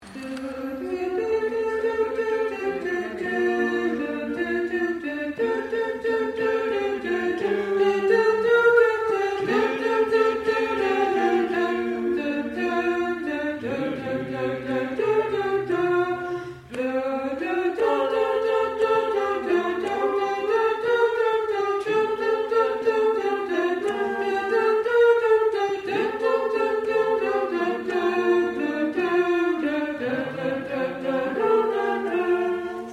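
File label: Soprano Tune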